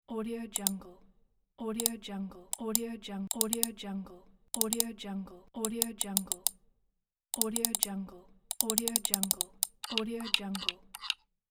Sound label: computer mouse clicks and scrolling; geramusic